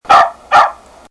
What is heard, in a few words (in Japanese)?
wan